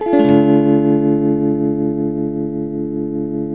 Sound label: TELTMUMIN D#, TELE CHORDS, Guitars; Spectrasonics; Hans Zimmer Guitars VOL-1